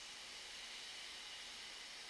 syceni; Mafie 1

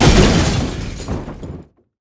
narazene autko; Mafie 1

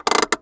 auto rucni brzda; Mafie 1

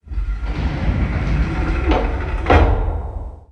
trezor; Mafie 1

